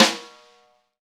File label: SNR, Roland; Real Snrs